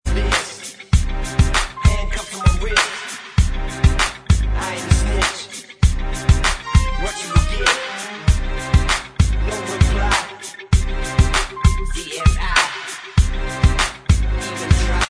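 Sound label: backing vocals, backing track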